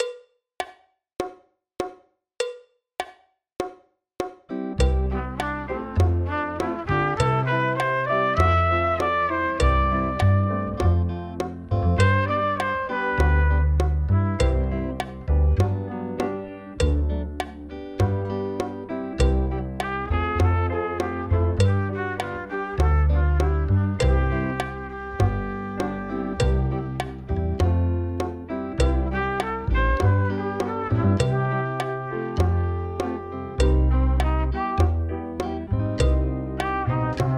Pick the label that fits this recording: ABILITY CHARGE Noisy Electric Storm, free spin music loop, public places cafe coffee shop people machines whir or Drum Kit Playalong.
Drum Kit Playalong